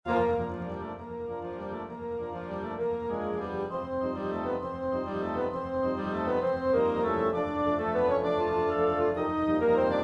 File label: Sleeping Beauty Waltz